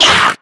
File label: attack grunt, headcrab, creatures; headcrab classic; vox